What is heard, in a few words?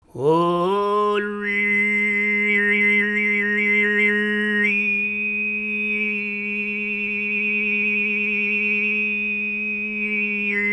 FREE, TUVAN MID; Spectrasonics Vocal Planet CD5; World Voices